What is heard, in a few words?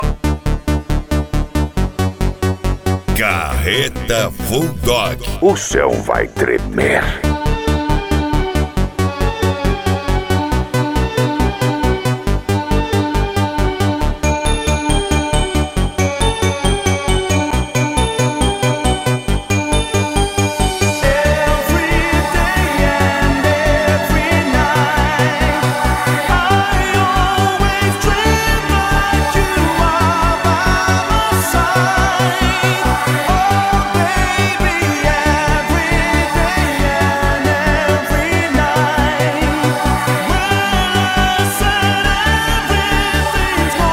flashback